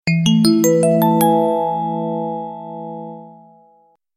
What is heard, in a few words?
Japan Train Station